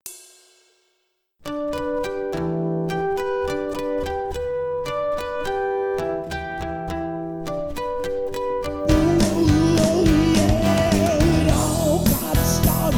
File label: song scratch; onelivingchurch